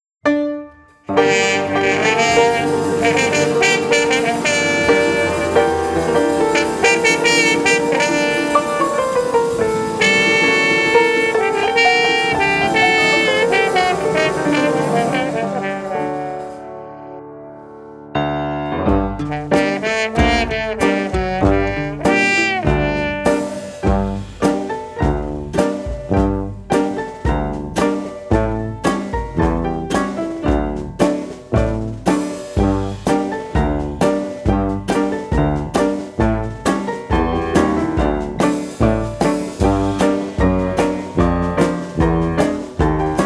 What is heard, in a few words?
Karaoke